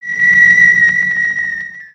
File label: Spider Boss Fire Rain Whistle; creatures mechanical